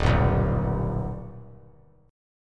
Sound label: Eb Augmented Eb; strummed; JGuitar